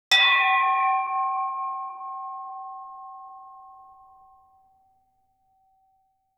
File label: temple conch shell sound, ceremony